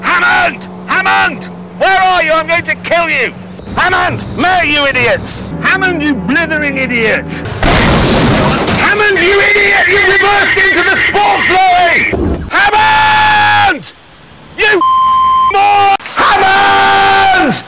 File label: hammond